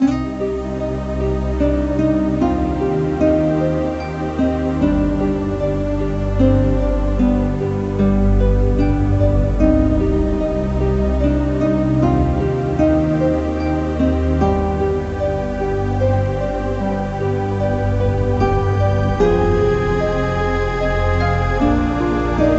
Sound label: Music Menu; Jewel Match 3; NDS